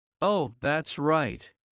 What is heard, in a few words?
Heart low; Classroom humanbody